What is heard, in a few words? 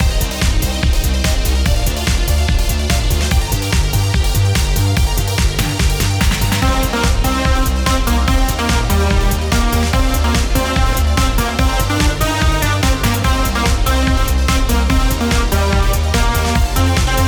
neon sign circuit remake bpm145